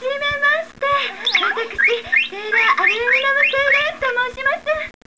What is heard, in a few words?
siren